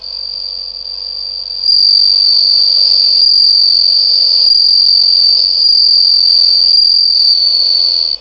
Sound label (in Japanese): suzumusi